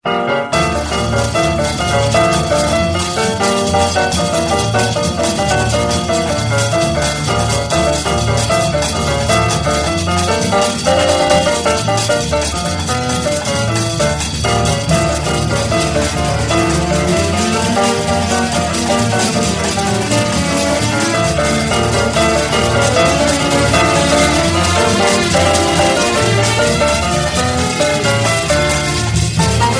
Samba, Piano, Strings and Bossa Nova

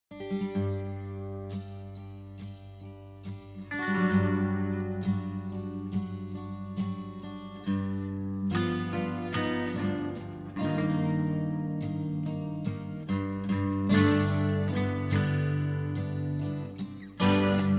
Mix Minus Piano

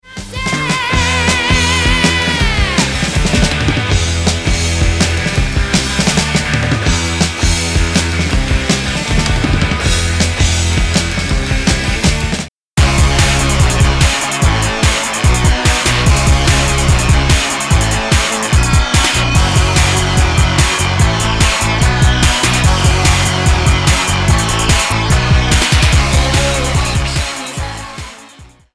Melody

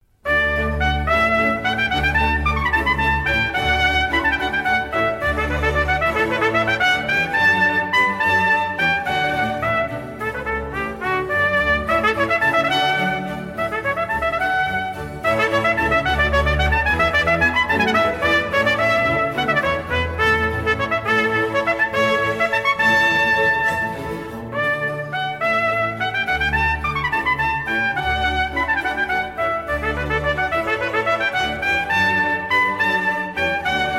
Trumpet Sonata in D Major, Allegro; III. Allegro; Baroque Trumpet Concertos

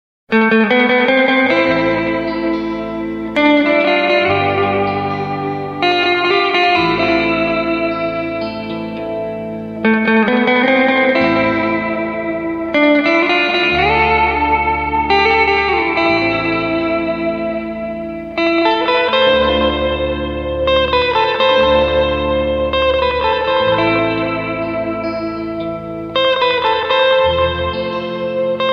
Guitar; Guitar Mania Vol.02

